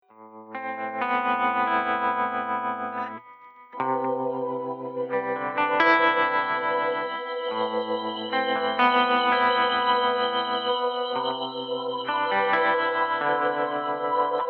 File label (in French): orage